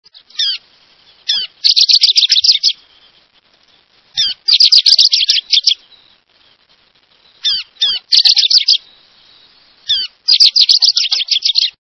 Rufous crowned Sparrow, songs

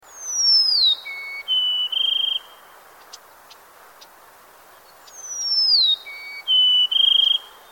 Golden crowned Sparrow, songs

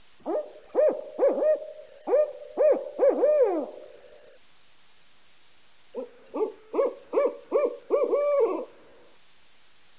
Barred Owl

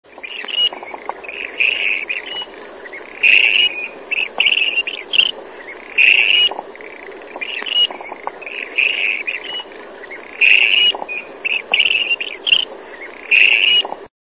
Clarks Grebe

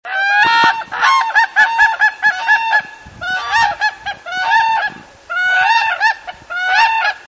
Whooping Crane

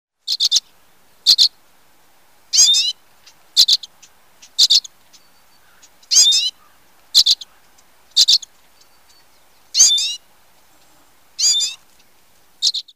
Common Redpoll

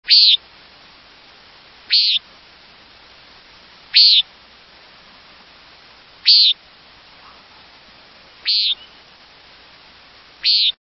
Common Nighthawk, songs

